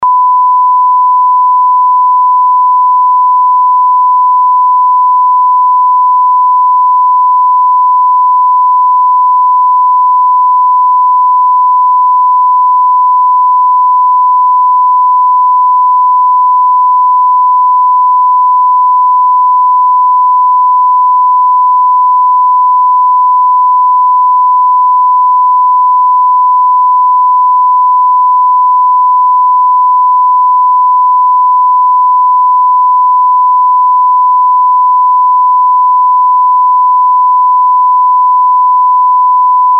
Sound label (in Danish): saml opgave a kompressor i tomgang plus20db gain 94 db kalibreringstone 0db gain; toneanalyser den danske metode